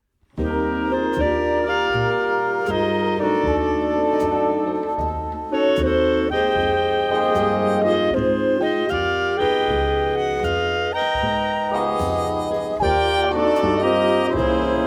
clarinet serenade

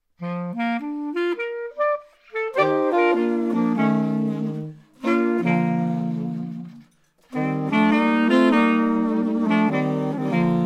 jungle blues

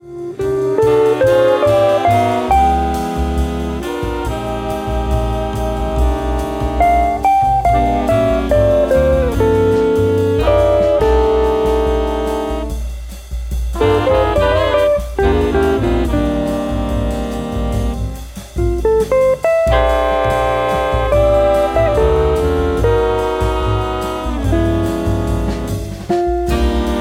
Octet

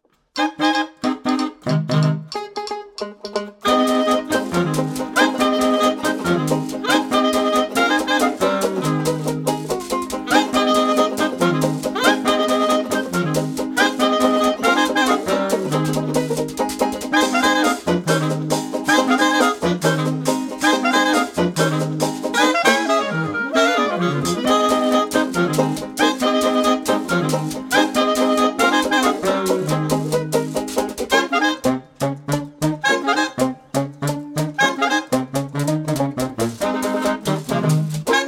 stomp

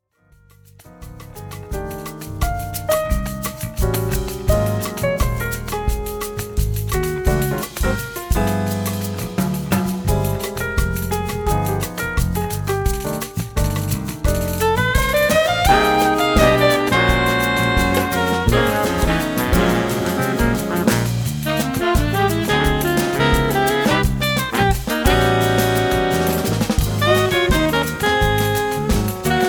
bossa

